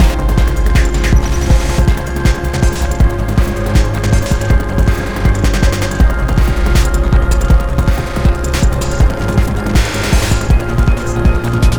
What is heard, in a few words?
Waterworks Murky Fast; rive wreck hack die retry; ps4